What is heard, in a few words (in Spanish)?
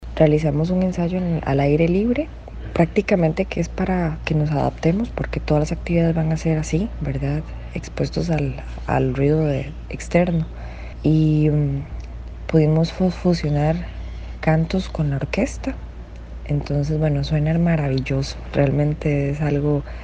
AUDIO CORO